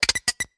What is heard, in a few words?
click click sms tone